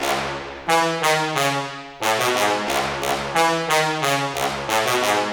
Brass Loop - 180 BPM C# Min; Instruments; Brass Loops; Scarlet; Cymatics; EDM Starter Pack

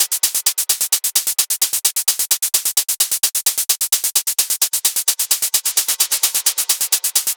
Hat Loop 130, Techno

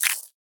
Perc, Psytrance; Hats & Percs

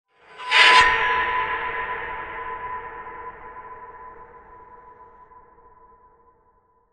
Epic

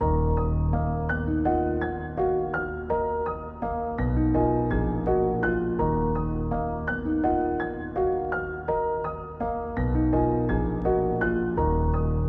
Dark Melody Loop, 83 BPM C Min; Cymatics; 2020 Melody Collection

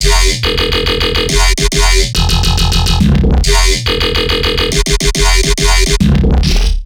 Riddim Bass Loop F 140; PiranhaGun; Ghosthack; Riddim Essentials Volume 2

